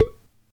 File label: pipe Hit; World Of Goo